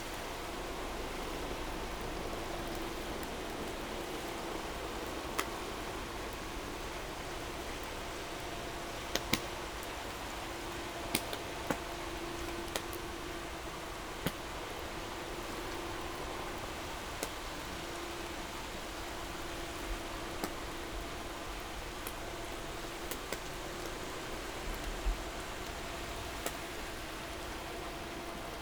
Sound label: No Ship, helicopter